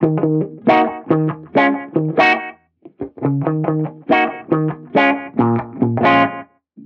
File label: Reggae Guitar Loop 140 BPM D# Maj; Vibrations; Cymatics; Vibrations Guitar Sample Pack